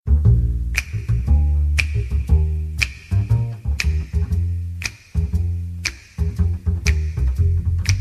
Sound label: contrabass sound